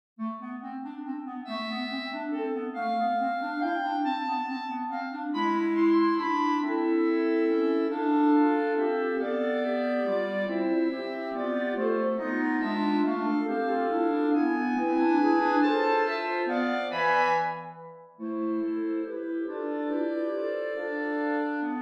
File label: for clarinets flat; queluz monte abraao